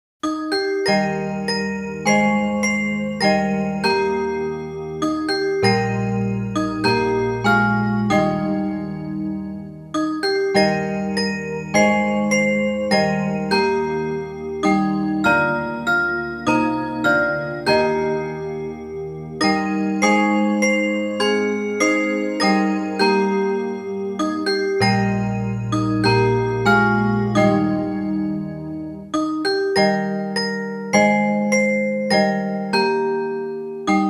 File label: music only; portuguese; childrens songbook